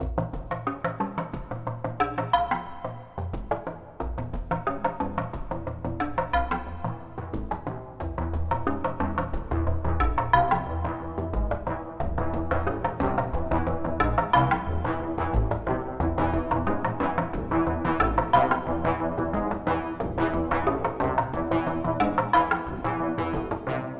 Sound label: Increasing Drama